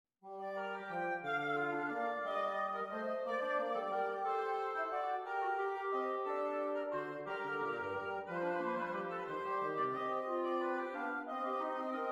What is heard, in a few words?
Sinfonia in G Minor; No. 11; BWV 797